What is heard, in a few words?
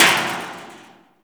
DUMPSTR DIVE, FILM PERC; Drums In Your FACE!